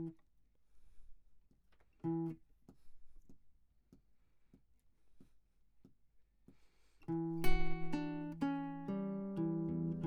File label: Classic Guitar